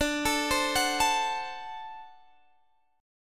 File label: D 7th